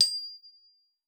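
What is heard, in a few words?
glockenspiel D4; Xsample VOL-4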